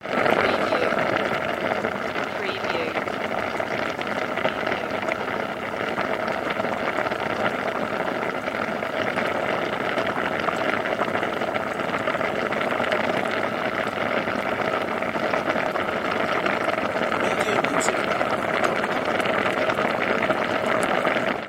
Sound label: Boiling